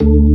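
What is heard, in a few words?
FAST HAMMOND D2, HAMMOND B3; InVision Interactive; Lightware VOL-1; Instruments & Percussions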